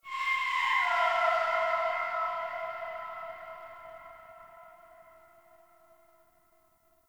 Sentient Lair Spirit Chatter Three C; Warframe

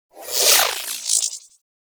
Overcharged Gyro Move; Warframe; Warframes; Gyre

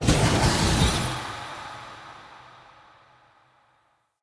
Freeze Mote Pickup Trail Move Burst; Motes

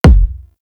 Grenade Launcher Sub; Grineer; Weapons

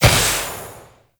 Vial Ability Gas Shatter; Warframe; Warframes; Alchemist; Elements

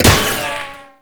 Tank Shield Impact; Warframe; Lasrian Tank

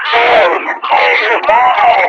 Enemy Death; Warframe; Lasrian; Battle Barks

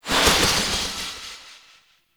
Impale Cast Release Tail; Warframe; Hoplite

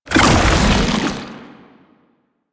Babau Strafe, Foley; Proto Infested

